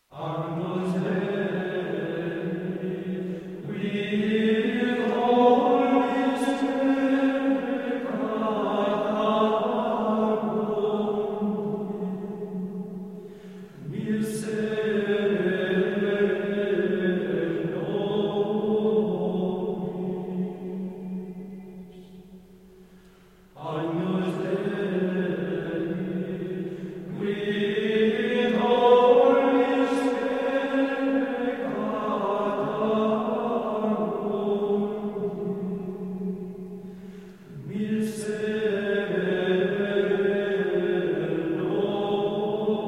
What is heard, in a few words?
Coro de Monjes Agnus Dei, Gregorian chant; Agnus Dei II; Altspanische Gesänge